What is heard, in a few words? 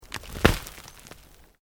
Earth Loose Move Short; Material components for constructing more complex sounds; Debris; Materials; SoundEffects Foundation2